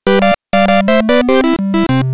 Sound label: die; 超级玛丽